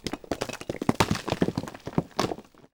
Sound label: CRUMBLE